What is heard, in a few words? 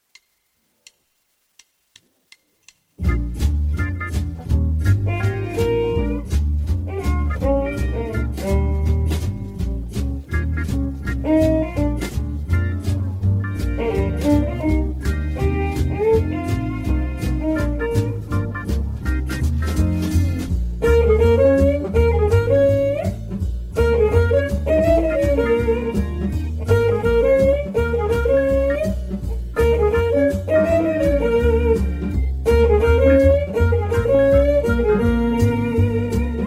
Bop Blues